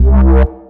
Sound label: warp